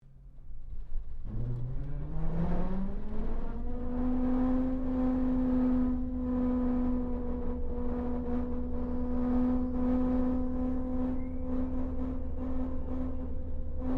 AIRCRAFT LOCKHEED L-1049 SUPER CONSELLATION STARTUP INTERIOR; Connie, Super Constellation; 1955; Airplanes